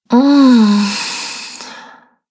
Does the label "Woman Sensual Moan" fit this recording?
Yes